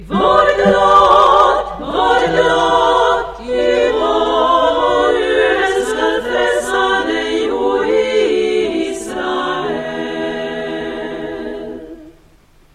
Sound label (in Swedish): Immanuel refr tutti